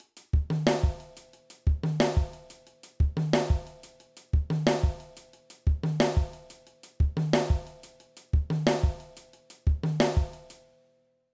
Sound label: Keep a Backbeat, drum